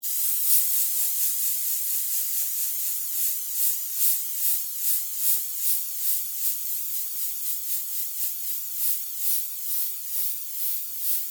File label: Household Pesticide Spray Movement Mist; Yard Tools